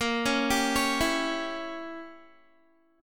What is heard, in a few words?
Bb Diminished 7th Bb down Guitar; arpeggiated; 4E,4A,5D,5G,5C,6F; Bbdim7